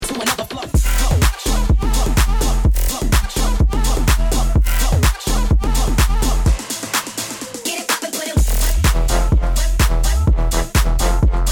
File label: start action, music